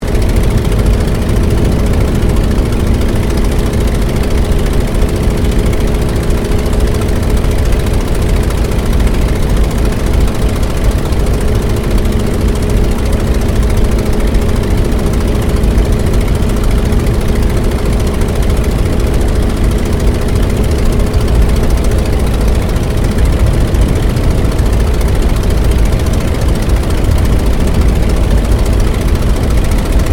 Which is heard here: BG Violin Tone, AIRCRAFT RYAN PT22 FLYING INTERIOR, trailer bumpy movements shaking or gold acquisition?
AIRCRAFT RYAN PT22 FLYING INTERIOR